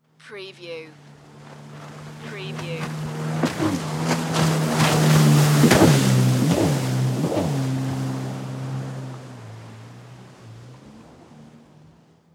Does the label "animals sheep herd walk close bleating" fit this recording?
No